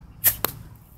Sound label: shoot; Teemos Dart Shooter Cosplay Prop With Arduino